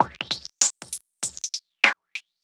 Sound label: Old School Break, Drums